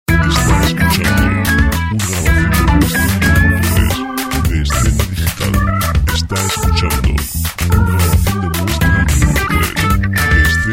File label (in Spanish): rock sintonia; Música "rock"